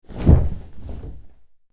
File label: Dracula cloak movement vampire whoosh